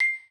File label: Pop